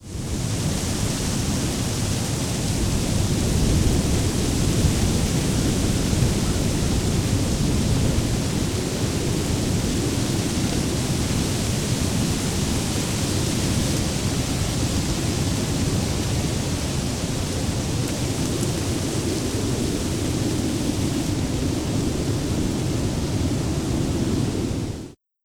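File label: Yosem Bird wind, Yosemite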